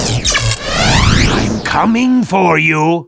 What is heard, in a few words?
fake death